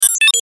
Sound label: DIGITAL RESPONSE; Multimedia; Sound Design; Blastwave